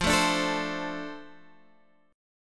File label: F 6th F down Guitar Drop D